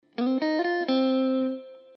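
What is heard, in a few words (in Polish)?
zagrywka po skali C-C, guitar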